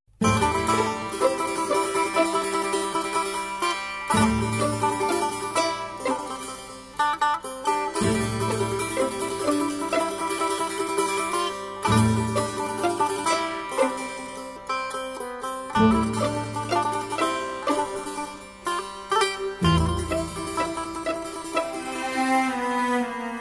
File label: TAR VA SETAR; DASHTI